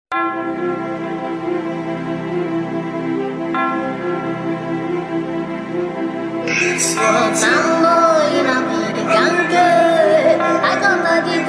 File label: Drill Remix